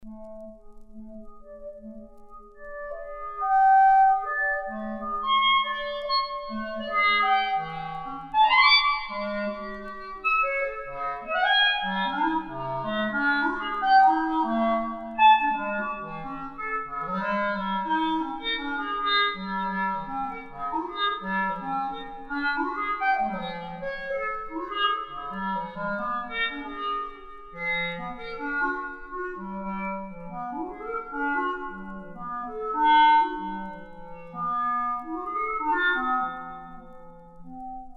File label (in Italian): Sequenza IX per clarinetto; Luciano Berio